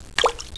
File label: DRIP, Household